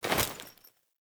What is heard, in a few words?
Female Foley Action Default Melee Heavy Swipe, Default Clothing; Characters; Player